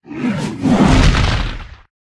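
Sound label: Pet Gorilla buff; pets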